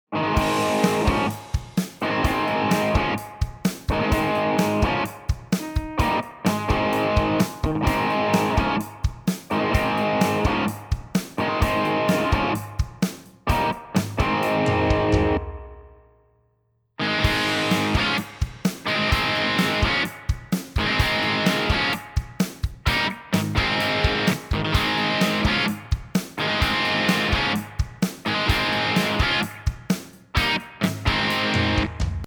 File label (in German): Vergleich Vox AC30